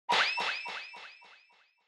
Motion Blow Away Short Delay

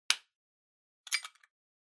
Pocket Watch Snap Off Case Open

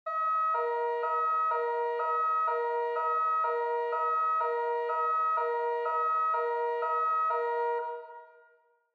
Police Car Siren Far

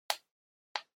Handheld Transceiver Button

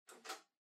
Portable Stereo Far Tape Pause

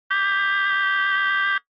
Retro Anime System

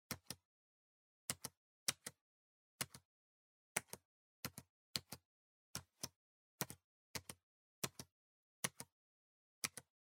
PC Keyboard (Gentle)

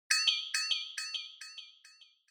Percussive Accent Delay; 打楽器アクセント; ディレイ

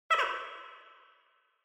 Horror Laugh Reverb

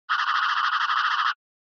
SNES Racing Tire Squeal

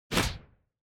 Motion Grab

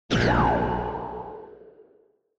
Retro Anime Accent High

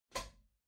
Wall Outlet Far Dry Unplug Hard

